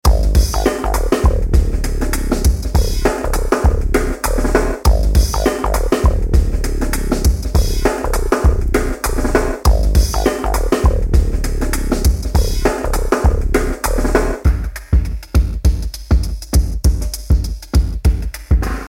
ringtone

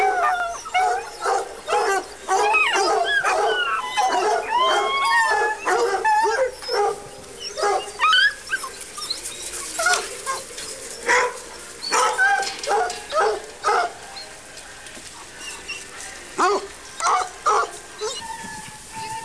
hounds

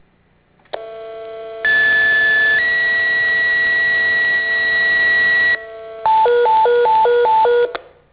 tone

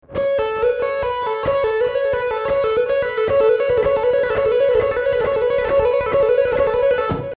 legato

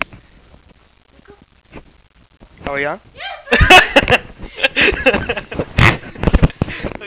haha

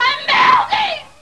melting

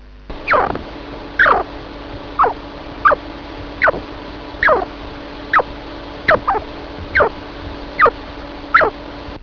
sleepy, chinchilla

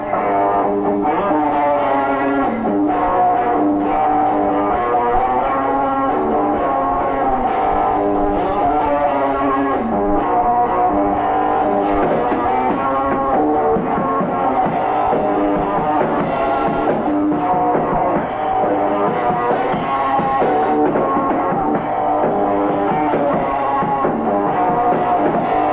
Riff

